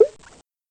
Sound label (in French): bruit; MOBICLUB